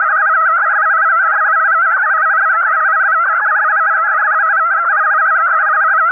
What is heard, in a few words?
heal, antlion grub; hl2